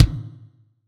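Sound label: acoustic bass drum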